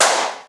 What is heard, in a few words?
Dance cl, Roland JD-990, Drum Samples